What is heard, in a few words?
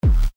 kick tube more dynamic